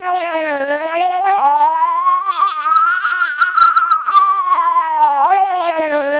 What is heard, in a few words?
siren, vocoders lowrate; TWELP 400 bps